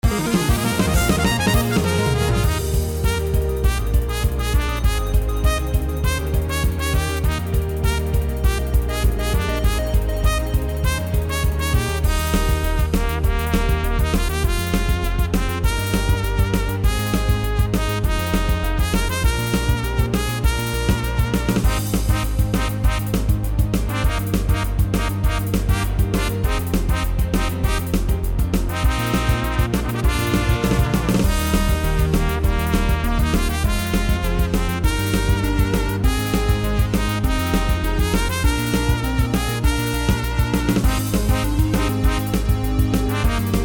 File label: battle; Manamon Music; Manamon 2